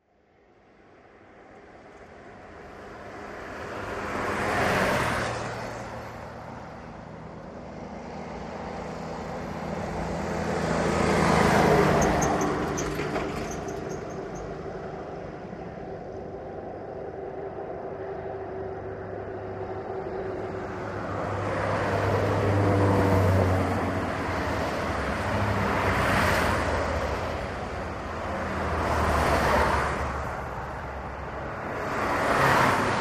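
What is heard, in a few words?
Multiple Car Bys 40mph, highway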